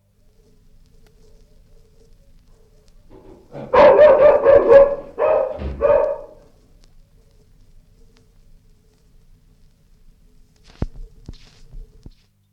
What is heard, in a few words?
Dog barks as door opened & closed; Dog Sound Effects; Animals; BBC